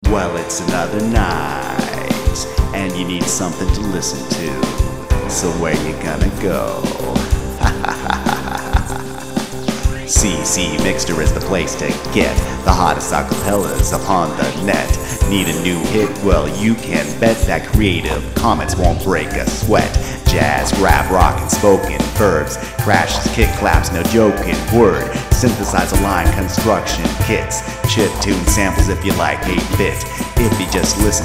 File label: Rap 95 BPM; CCMixter